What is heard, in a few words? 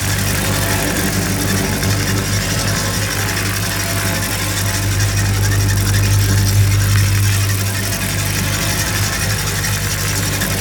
Explosion Charge Loop; Warframe; Warframes; Khora